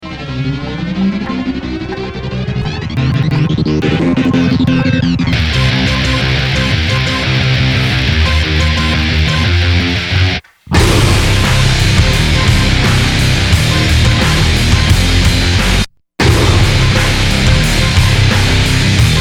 gt 8; gut bust

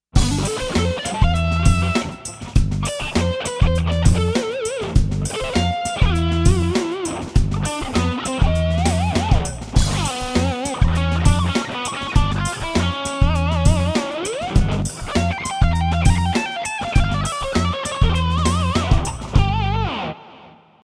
pop shred

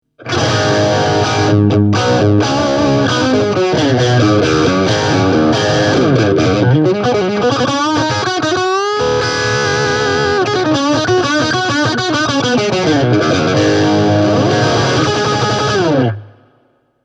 overdrive; cube-20gx

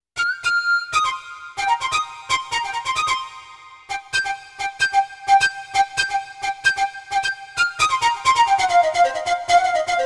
pipe solo

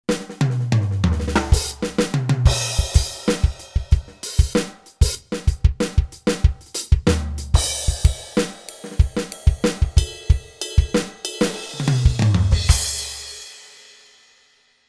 kit

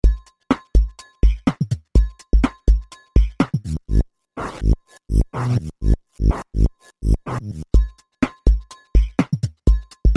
v synth xt lofi synth kit

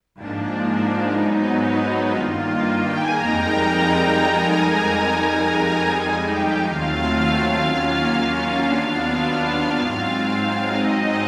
strings

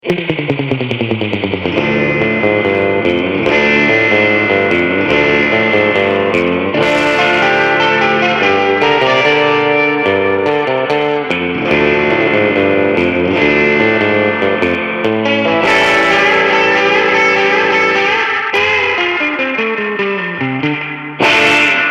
surf; tone demo

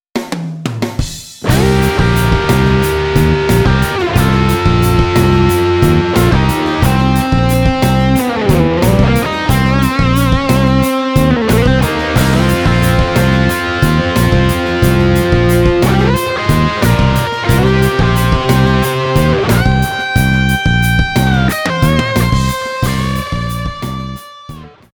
patch demo

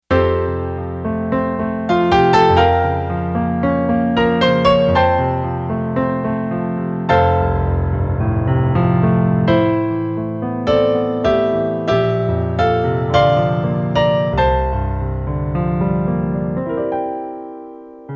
piano

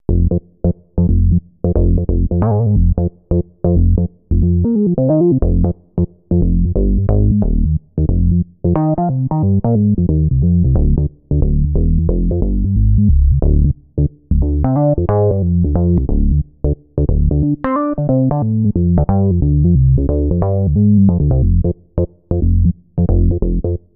v synth boogie bass

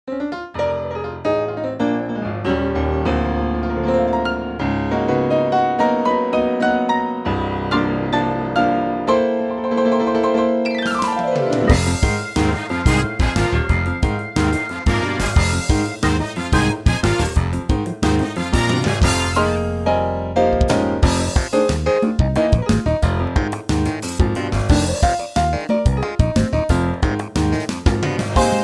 macho blues

